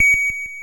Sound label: pickup; tileworld; boulder dash